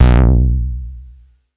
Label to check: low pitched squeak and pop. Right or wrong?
Wrong